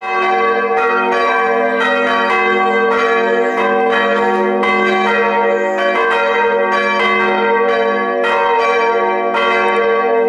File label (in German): Glocken